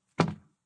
Wallet Drop Table, Sound of leather wallet dropped onto wooden table.; Thuds